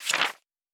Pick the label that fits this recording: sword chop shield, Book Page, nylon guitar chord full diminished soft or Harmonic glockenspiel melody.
Book Page